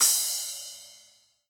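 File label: splash cymbal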